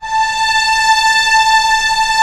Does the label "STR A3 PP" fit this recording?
Yes